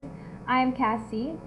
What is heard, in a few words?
beat